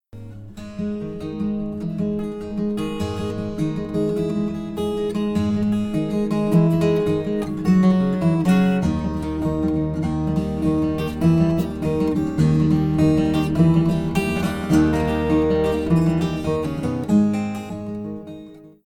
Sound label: 4th fret